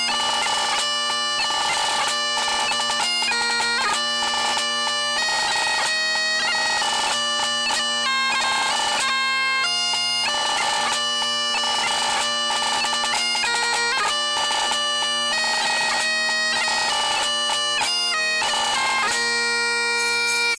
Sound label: Jingle Bells Original